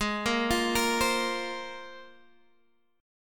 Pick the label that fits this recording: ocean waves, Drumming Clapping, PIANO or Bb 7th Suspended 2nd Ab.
Bb 7th Suspended 2nd Ab